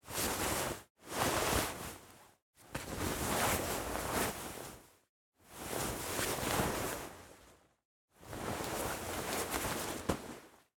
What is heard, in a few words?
Nylon Jacket Or Raincoat